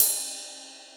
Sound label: kit, Yamaha Motif-ES8